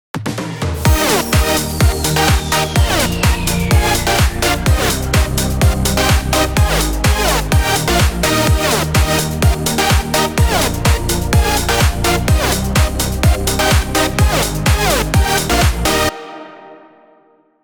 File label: progresive house project